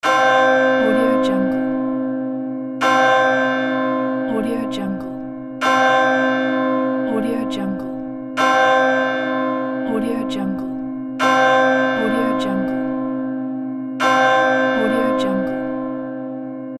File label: church bells loop; phillipmariani